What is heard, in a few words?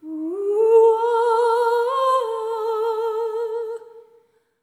LAMENT